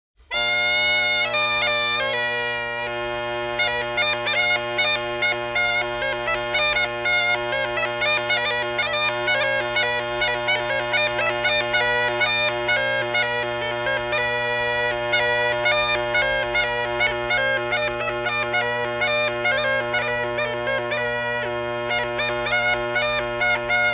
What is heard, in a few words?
Bagpipes Western Poland region